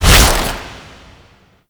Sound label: Infested Hard Drive Cudgel, melee attack; Warframe; Infested Lich